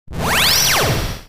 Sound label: Teleport Beam